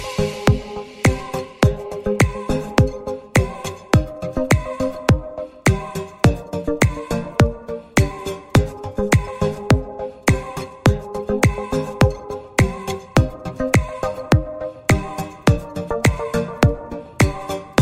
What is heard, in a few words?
Instrumental